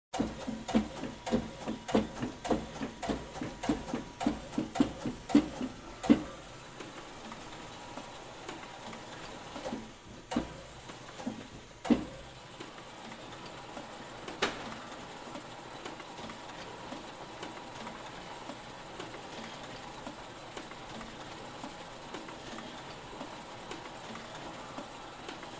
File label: Industrial Planer Machine with Heat Press